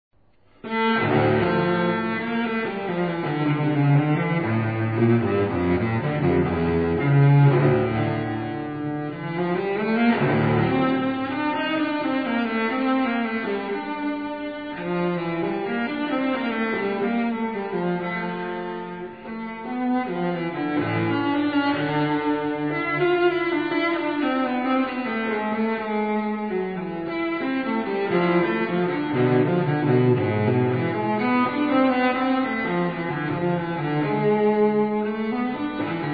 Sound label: Suite 2 D minor, BWV 1008, Allemande; tortelier